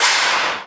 HR16B hit

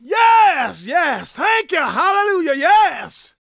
shouts, gospel preacher; Spectrasonics; Vocal Planet Vol.1 Gospel; akai

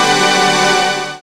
SYN PAD

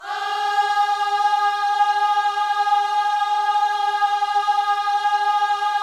OHS G#4, gospel; Spectrasonics; Vocal Planet Vol.1 Gospel; akai

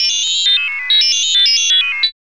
SYN-FX

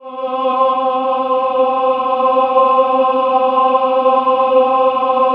CHOIR; Best Service; Robot Computers

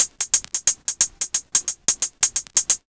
LOOP, DRUM; E-Lab; X Static Goldmine 2

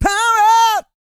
GOSPEL

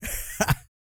LAUGH, Vocal Jazz Fx

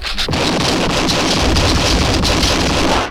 FX GUNS; E-Lab; X Static Goldmine 2; akai

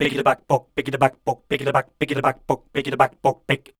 SCAT C, Voice

